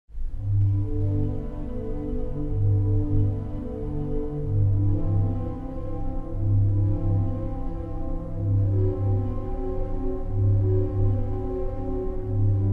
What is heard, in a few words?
cello bach